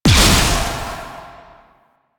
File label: Rifle Projectile Explosion; Weapons; Warframe; Duviri; Incarnon Adapters